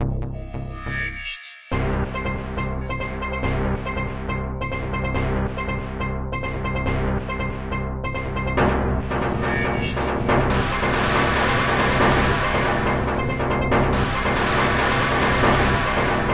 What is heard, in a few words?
Metal